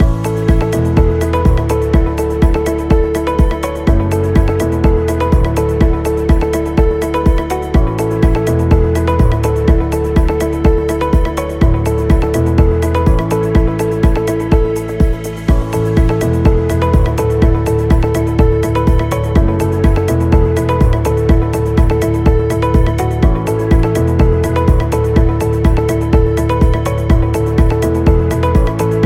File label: Real Estate Loop; service business